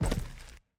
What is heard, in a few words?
Footsteps Concrete Soldier Crouch; Levan Nadashvili